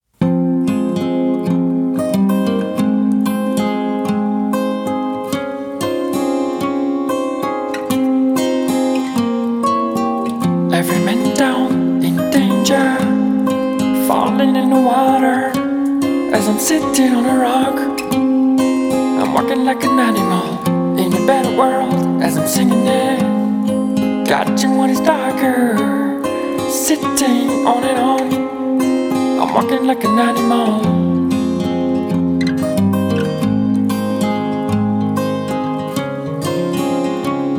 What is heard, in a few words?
acoustic version